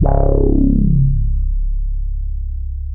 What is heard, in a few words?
C#2, Bass; E-MU Producer Series Vol. 6 – Analogia Project (CD 1); Boom da Bass 1